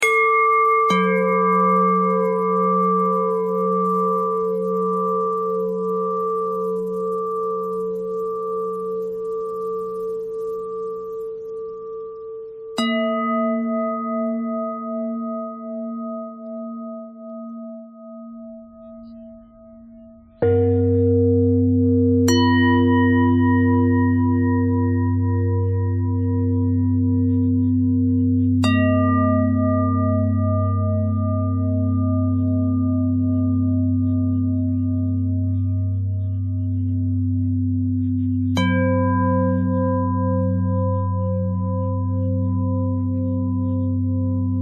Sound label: bell thread bend thread